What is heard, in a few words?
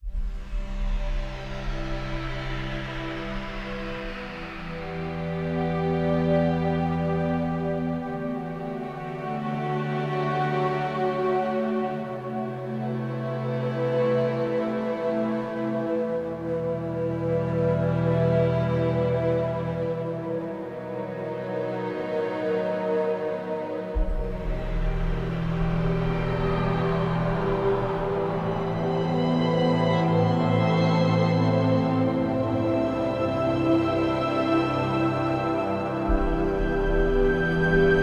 Loss Ambient